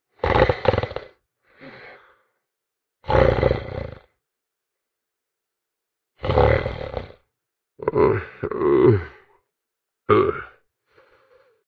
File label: sleep; customnpcs